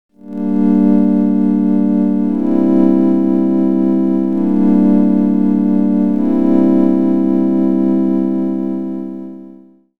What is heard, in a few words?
juce chords